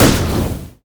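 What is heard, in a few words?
q spell explosion; LoL; ziggs poolparty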